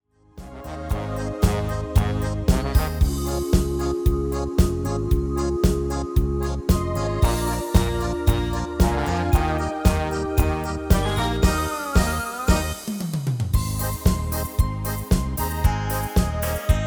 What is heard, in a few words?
MIDI